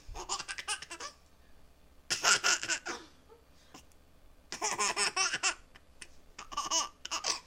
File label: Laugh